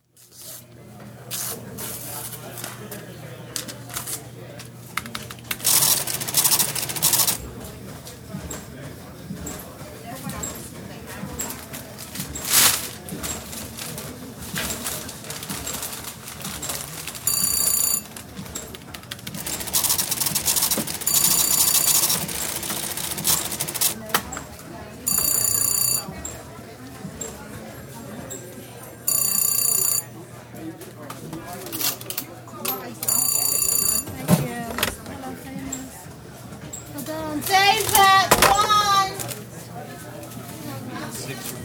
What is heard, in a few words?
Philadelphia: Counter & till in delicatessen; Restaurant Sound Effects; BBC